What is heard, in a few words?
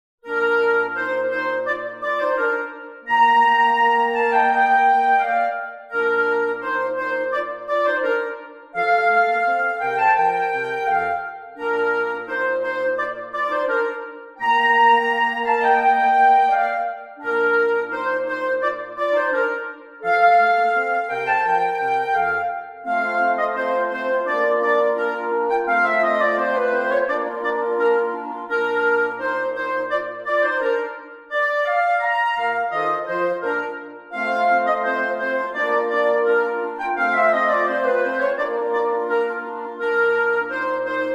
Clarinet Variations; Masek